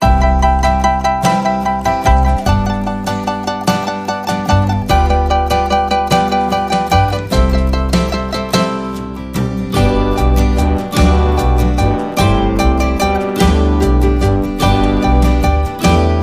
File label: Chicken Skin Waltz